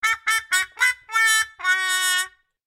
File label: Cartoon Sound